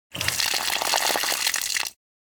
water spring; anemoi

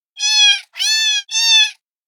kitten meow; anemoi

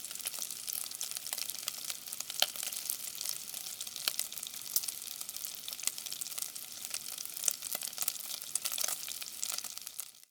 cook grilling; anemoi

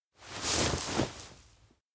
grassland wake; anemoi

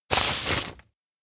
paper bundle show design; anemoi

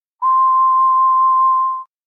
elec blow ocarina; anemoi

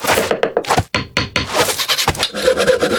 build nails saw loop; anemoi パッケージ版 1.1

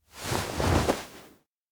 arm around neck; awayuki

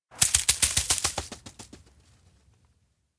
steps outdoor mountain road walk away; anemoi

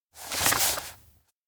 sketchbook flip; anemoi

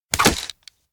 slap; awayuki; anemoi

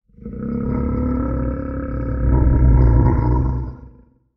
elec bear roar; anemoi

